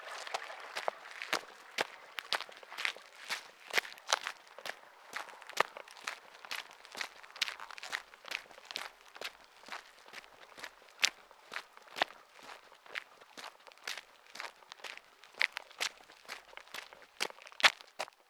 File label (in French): Pas gravier